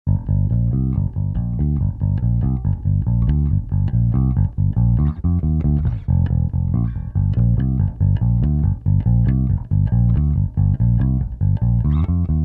rock; EBS

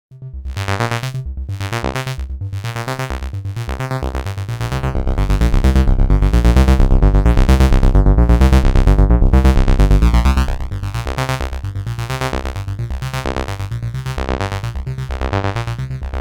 Waveshaping

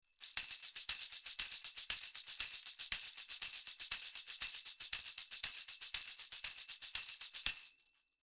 tambourin close; Octava 102

